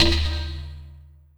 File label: PERC, Drum & Bass, 170 BPM